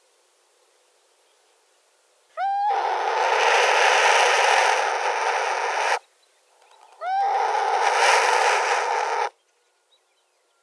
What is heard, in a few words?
call, burhinus oedicnemus; Agadir University; AudioMoth with internal microphone, cut off frequency 0.5 kHz, 48 kHz sampling frequency